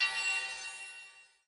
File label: item received